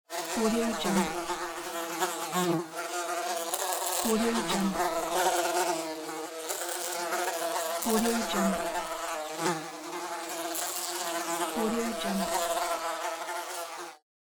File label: fly buzzing around the room